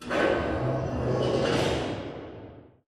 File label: Robot Arm Pass Left Deliver Dist; Boomtown; Titanfall 2